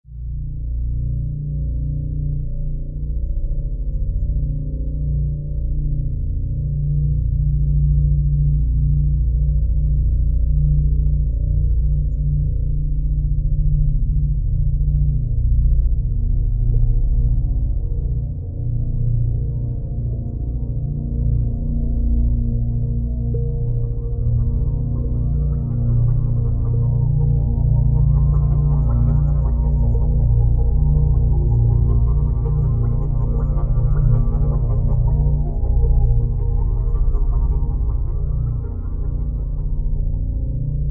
Drn Cell Growth (Bb); Brand X Music; Brand X Music Catalogue - Editor's Essentials

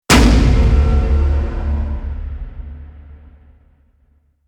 Slammer Hit Epic; Ninja Tracks

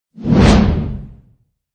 Whisk Wipe; Ninja Tracks

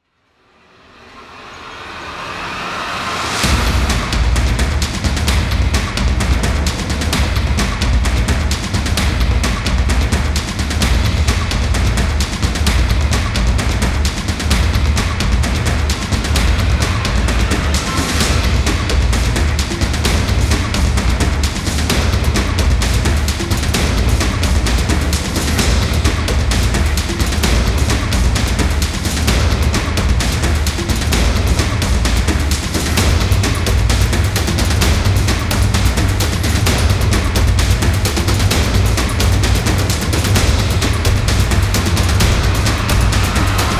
Percussion; Fired Earth Music

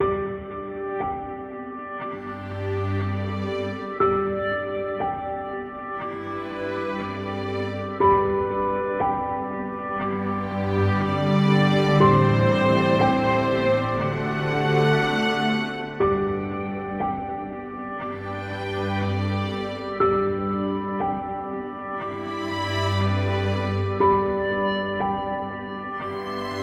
Delicate Ambient

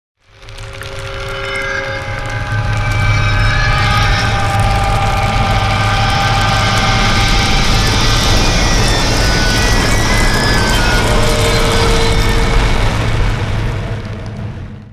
Screamer Screechers; Epic Tools vol. 1

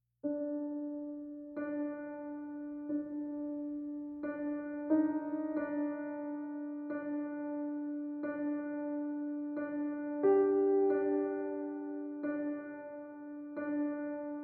Piano Only; Gothic Storm Music

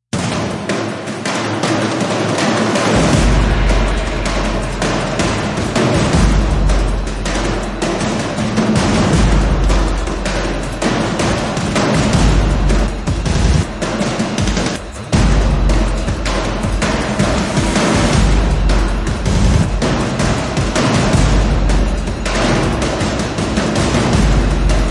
Tension Pursuit, Epic Speed Percussion; Gothic Storm Music

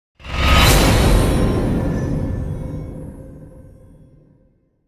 Fear Hit; Gothic Storm Music; Brain Warp (Sound Design Trailer Tools)

